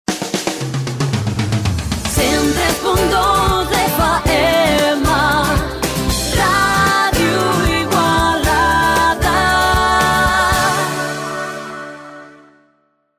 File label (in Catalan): Jingle Radio Igualada